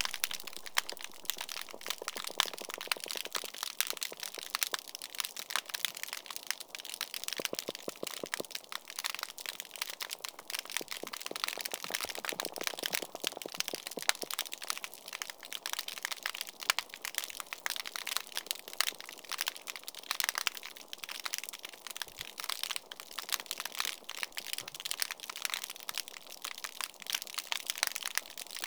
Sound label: Reef Sound Example